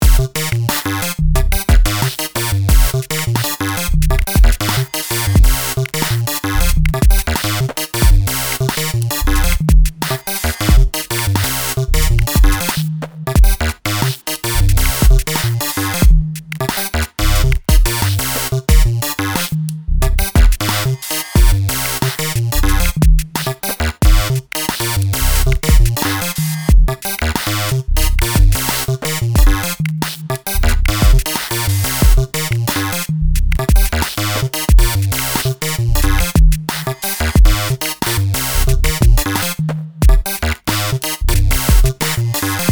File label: adjust step length drums, sequencer; Numerology; Five12